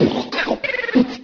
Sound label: jiggling, Beatbox; Drum loops